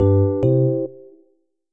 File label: complete